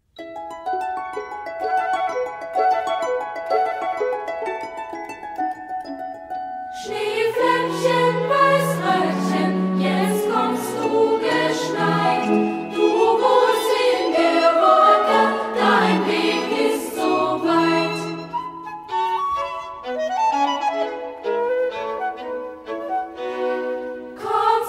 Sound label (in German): Kinderchor